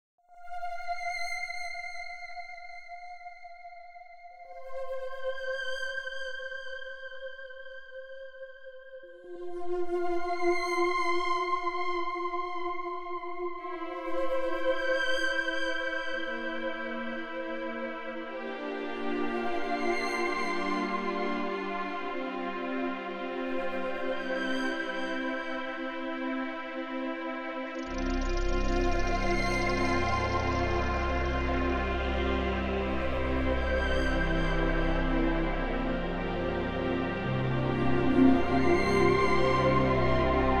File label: bass